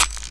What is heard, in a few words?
SD, dance drums hip